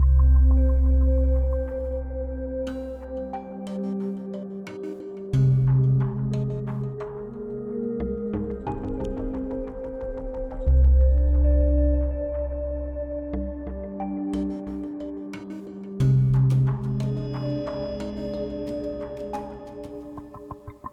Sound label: Mood Mysterious Light